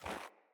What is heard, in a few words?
Sandals Run Snow, Footsteps; Player